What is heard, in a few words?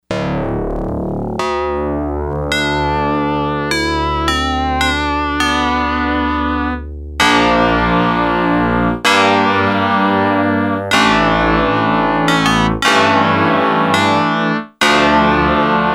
Metal Keys, Yamaha DX100; Factory Patches